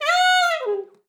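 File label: slide F#5, saxophone; Best Service ProSamples vol.42 - Session Instruments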